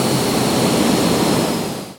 Jet Pack Loop Drill

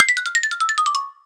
Cake Release; cake drop

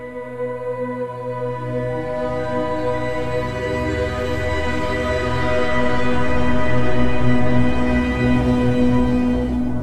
Cave Lo Intensity Bed; Torchlight III; PS4

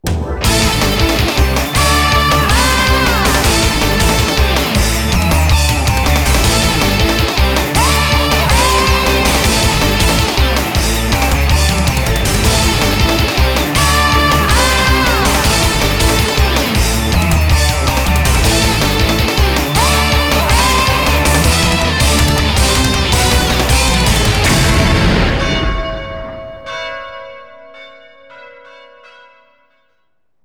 BGM Opening; Shin Gouketsuji Ichizoku Bonnou Kaihou

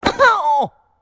Porky Hit; Character Porky Hit

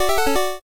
Jingle Minigame Answer; Dandy Dungeon Legend of Brave Yamada

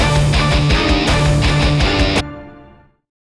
ActionEvent LONG Boss

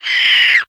Ant dies squeel; blastmorph pinball; xbox series xs

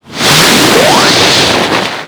Object Snowball; BOMBERGIRL RAINBOW; Arcade

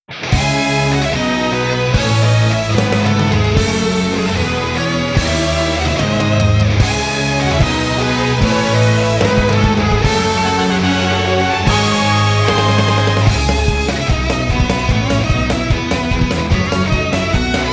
Bgm Battle

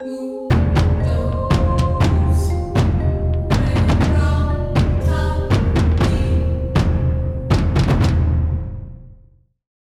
mood Bosque

